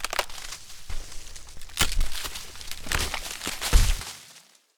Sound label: tree fall; 0ad